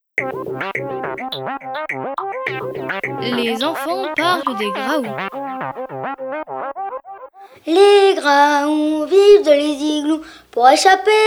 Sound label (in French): toux